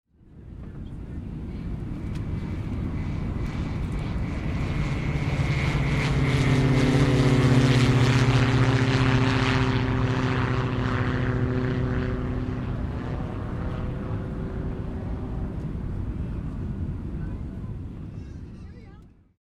Light aircraft pass by and then fading away; airplane

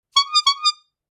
Clown horn

